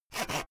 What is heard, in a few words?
sawing short